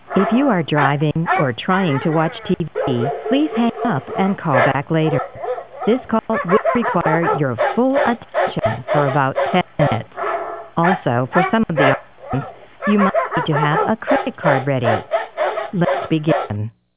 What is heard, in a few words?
Drops dogs